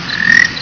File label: cliff chirping frog call; Texas; amphibian watch; texas nature trackers